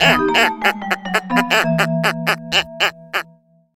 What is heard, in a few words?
Failed; hugo wintergames 2 windows gamerip 1998